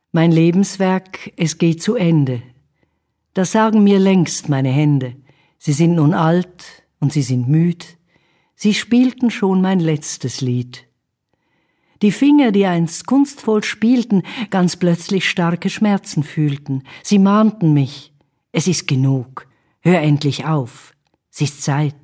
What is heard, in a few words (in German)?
Klavier